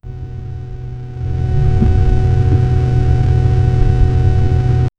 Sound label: infinitely repeating 8 bit